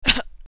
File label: hit; Assemblee SFX